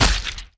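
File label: beat dueling sword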